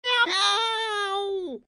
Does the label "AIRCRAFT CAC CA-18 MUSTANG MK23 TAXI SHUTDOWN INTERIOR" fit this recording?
No